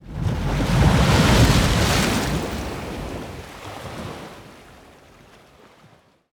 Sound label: submarines engines; World Of Warships